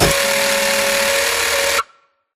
Screen Glitch Long; Boomtown; Titanfall 2

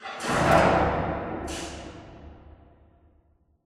Robot Arm Track Speed Change Dist; Boomtown; Titanfall 2

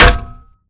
Imp Bullet Light Ballistic Solid Metal; Titanfall 2

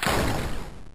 bullet generic titan shield scatter; Titanfall 2

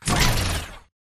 body flinch arm right; Titanfall 2

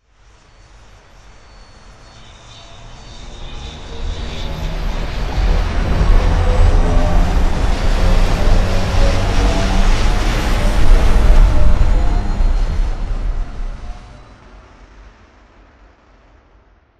hover; colony; stratton; Titanfall 2

